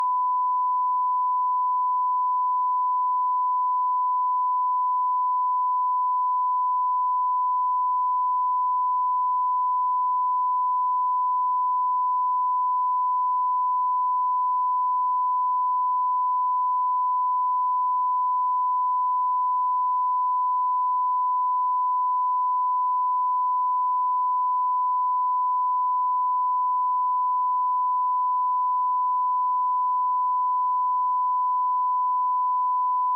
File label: 1k Tone; 1K Tone -20 96k 24bit DScope